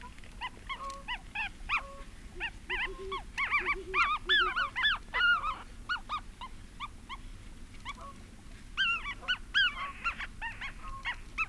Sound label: muskrat